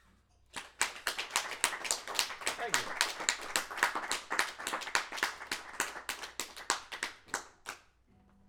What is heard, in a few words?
applause recorded with mic preamp; Wurcer mic preamp